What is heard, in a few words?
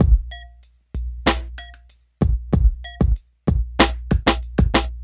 Smooth, R&B Drums